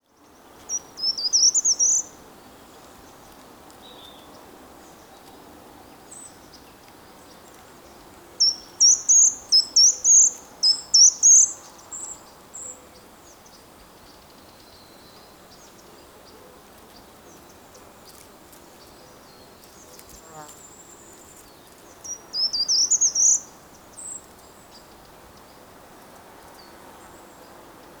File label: Short toed Treecreeper Certhia brachydactyla; chant des oiseaux des jardins